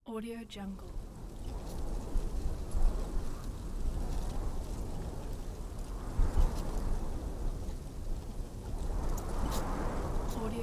a desert

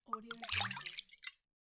water bottle spill

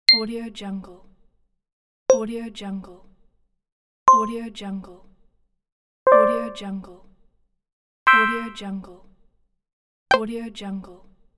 mobile ui

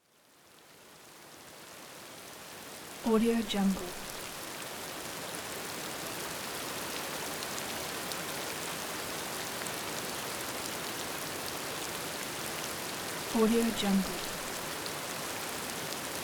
a rain

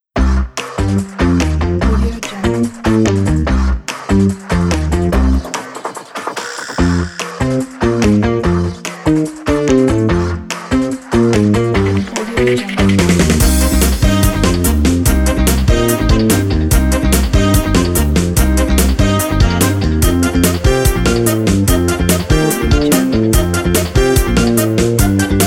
energetic funk music

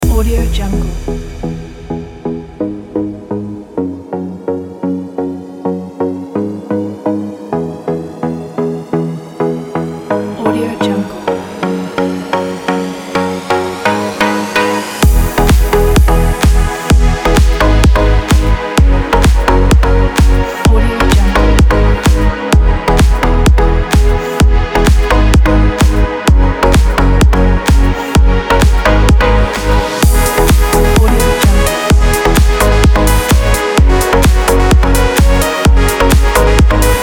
electronics

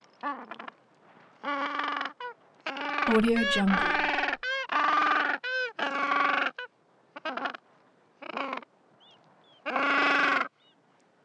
penguin female barky calls honks baby peep

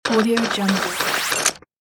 slot machine spin

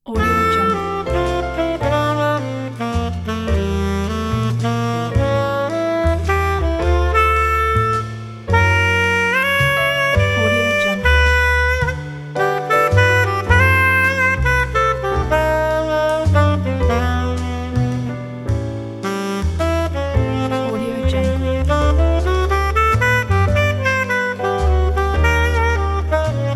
romantic jazz waltz

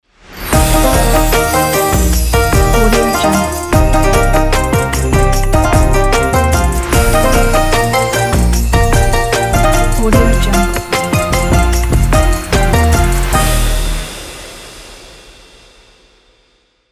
chinese new year celebration logo; audio-ninja

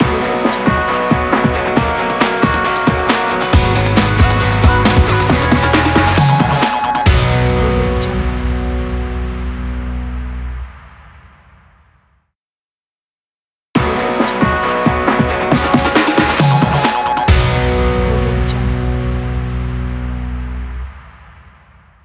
sports achievement logo pack

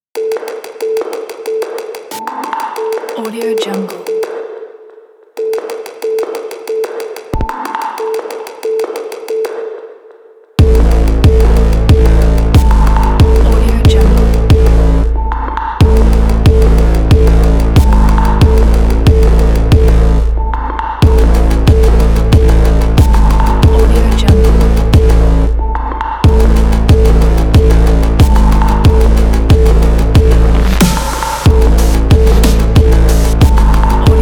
electro industrial hardcore

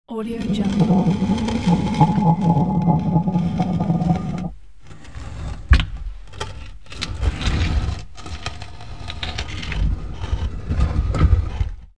heavy rock drag or grind; sound ideas

